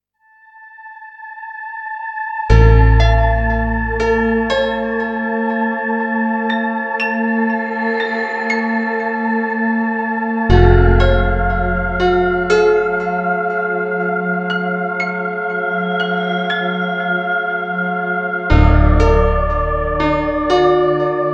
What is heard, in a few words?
Music One Shot